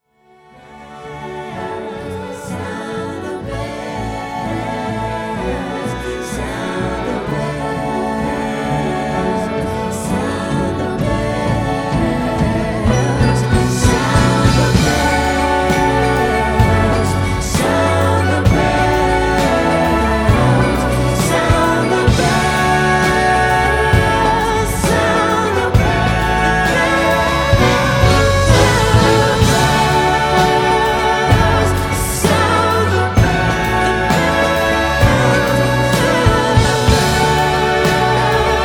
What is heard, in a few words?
sound the bells